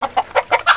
goofy

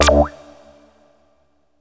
blink rev; BEAT2000

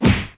HITS

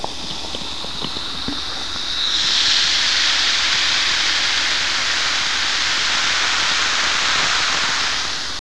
TIDE, NATURE

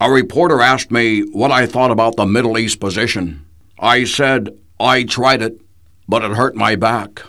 HURT BACK

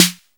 808 SNARE; DRUMS; cubase; CUBASIS

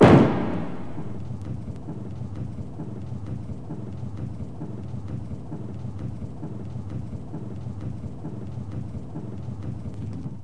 THROW, BOWLING; 40bestwin95

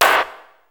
FROGGY; BEAT2000

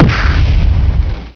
BLAM

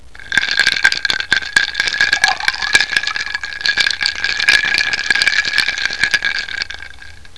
GLASS

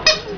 SQUEEK

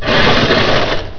shovels

